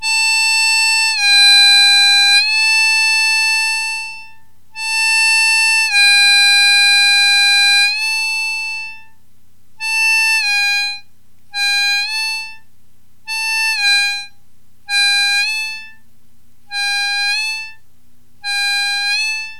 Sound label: bending, harmonica